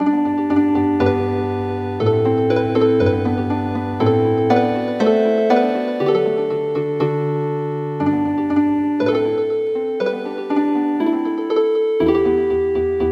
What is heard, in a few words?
Healin Harp